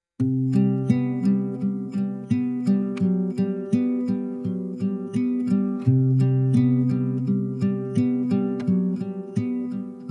peaceful meadow tune

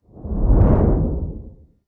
deep airy swoosh

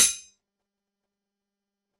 metallic button press

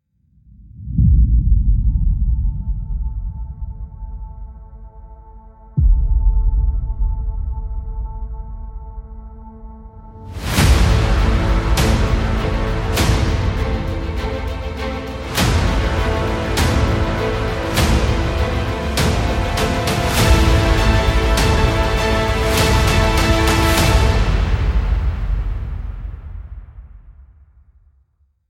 massive impact score

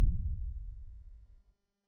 muffled heavy thud

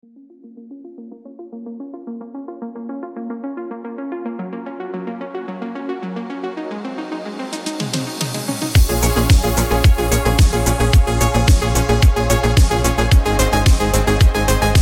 retro night drive

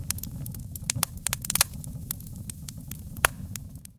burning log crackle

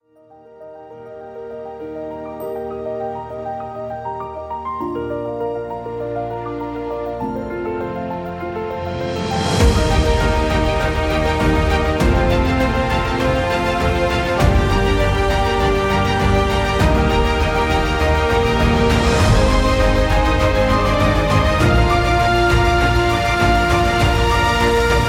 soaring hopeful epic

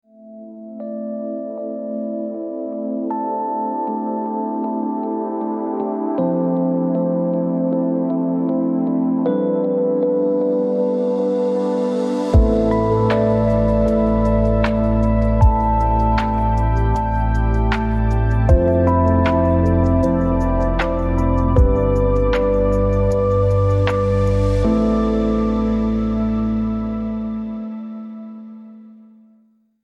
ethereal pad dream

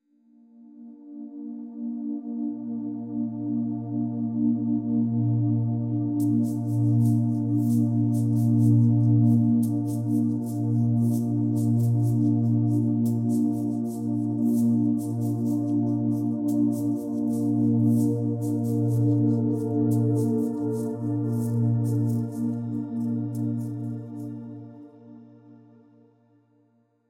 ethereal ambient textures

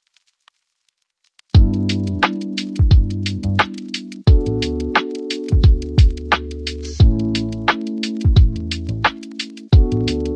urban focus beat

